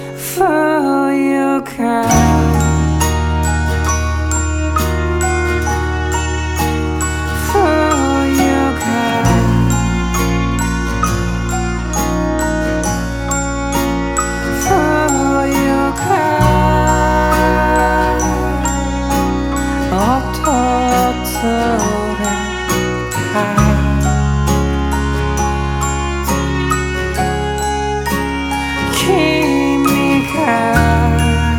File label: Country Music Track